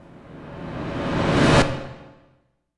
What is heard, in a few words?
IMPACT RUSH, Cinematic Impact; M-Audio Pro Sessions; Liquid Cinema